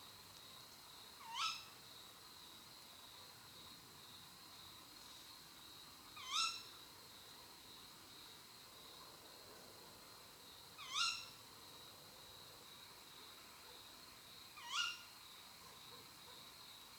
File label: canto verso femmina allocco strix aluco tawny owl waldkauz carabo comun chouette hulotte